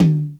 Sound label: Toms